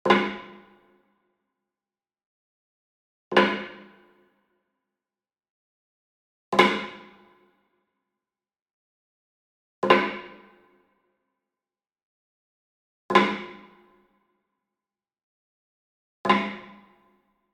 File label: WOOD PERCUSSION Log Drum Flam Medium; boom library; Cinematic Strikes Construction Kit